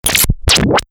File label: Break Noise; Best Of N2O Library; Breakers & Transitioners